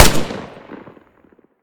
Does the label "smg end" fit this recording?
Yes